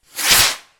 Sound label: launch